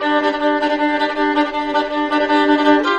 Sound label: violin; fiddle